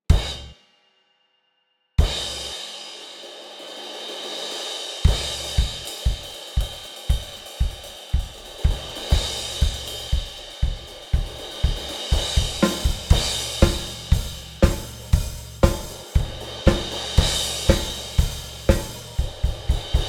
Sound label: Crash, cymbals; Gusta Star Light Cymbals